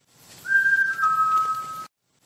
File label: Whistle